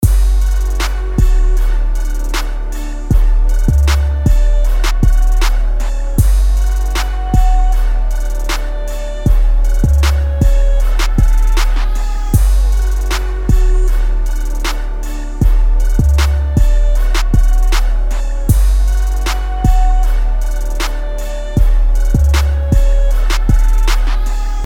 Trap

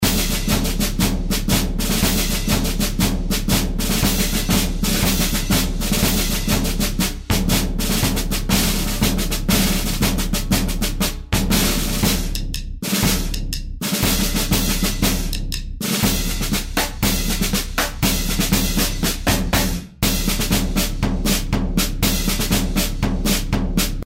military madness

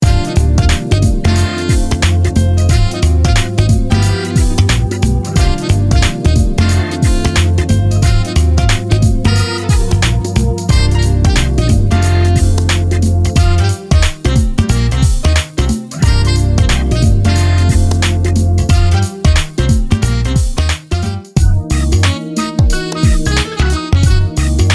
Smooth and Jazzy

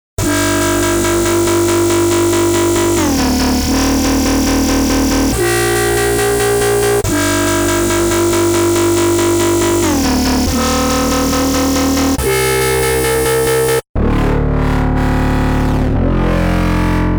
Dubstep Bass; Dubstep SX For NI Massive

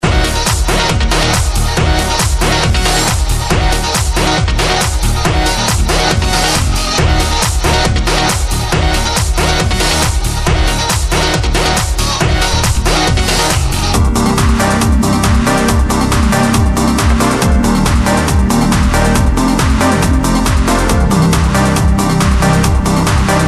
Epic Trance

